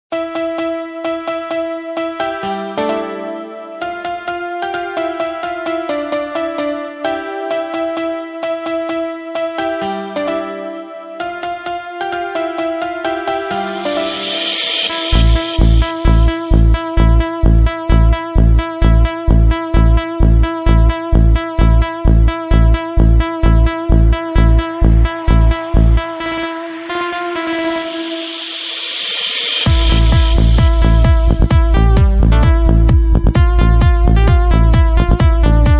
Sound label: jingle bells club remix; new year 2010